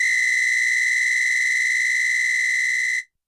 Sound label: Samba Whistle L, YAMAHA RM1X